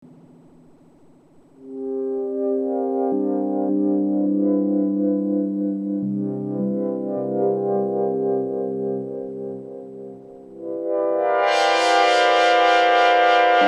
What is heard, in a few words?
boutique jp08 pad; roland